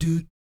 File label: "DOOT" E3, Vocal Jazz; Spectrasonics; Vocal Planet Vol.3 Jazz Fx